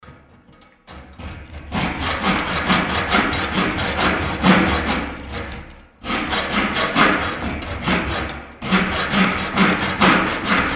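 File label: sound of saw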